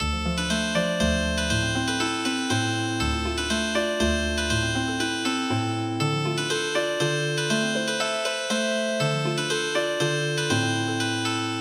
Healing Harp

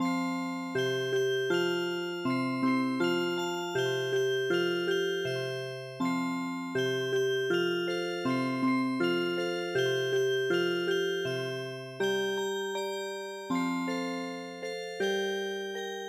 Music Box, traditional

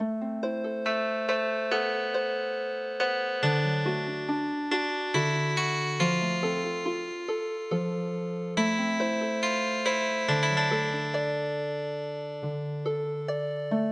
Pashto Lullaby Healing Harp

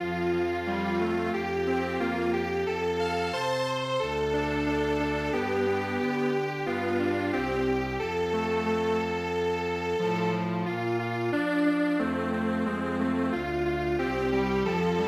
Strings Quartet

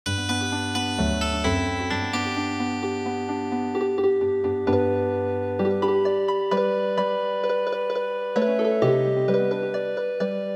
Healing Harp

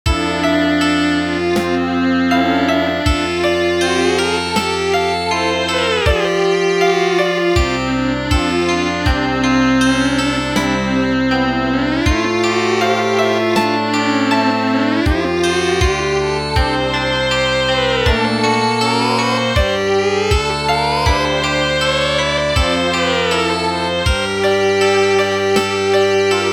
children

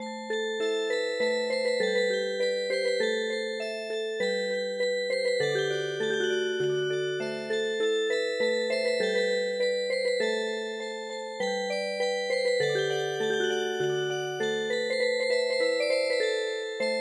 wide sky Music Box

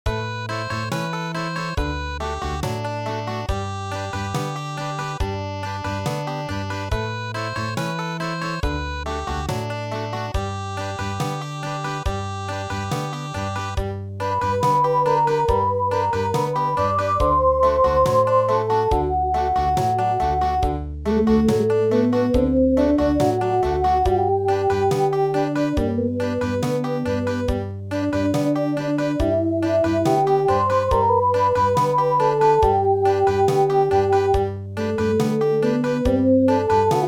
Country; AINA O MOLOKAI

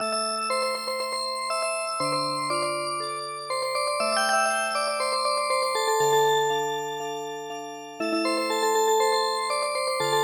Music Box

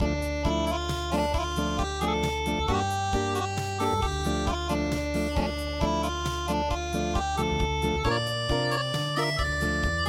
Acoustic, traditional; midi

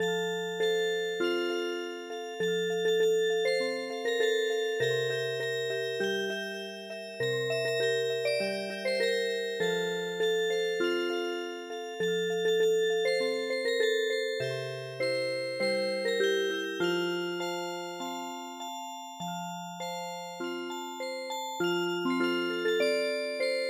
Music Box, traditional; Rock A My Soul

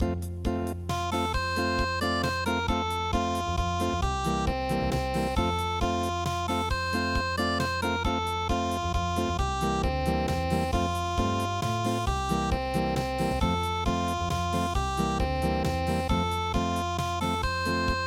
unpluged; Did You Ever See Lassie

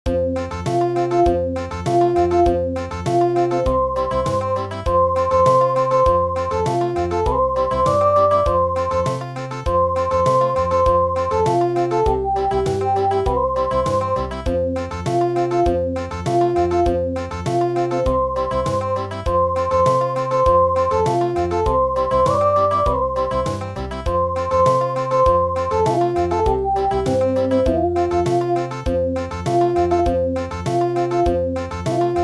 Country

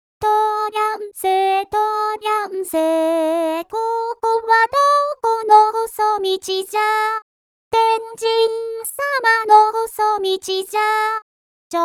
vocal only; traditional

